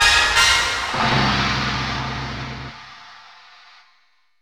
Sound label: Stage; Beat Saber Clear Fanfare